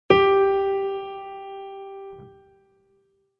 instruments piano so; maoudamashii

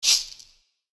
ignition; maoudamashii

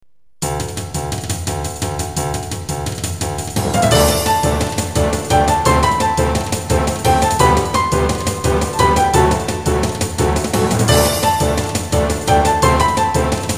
battle; yumenimitamidori

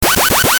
retro; maoudamashii

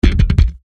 instruments bass; maoudamashii

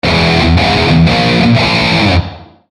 instruments guitar; maoudamashii

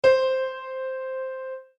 instruments piano do; maoudamashii

